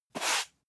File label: broom sweep single